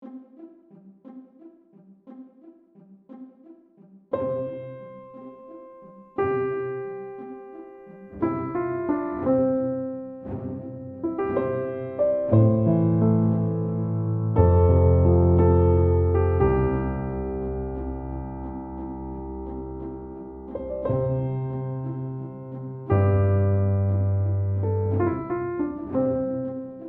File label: Piano Instrumental